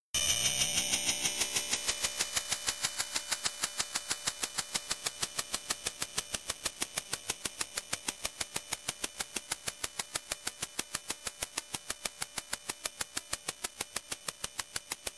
blip supercollider

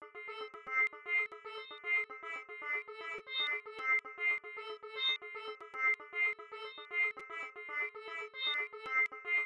Viola Sound

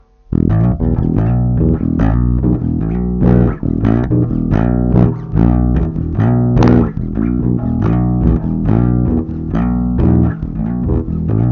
Bass line

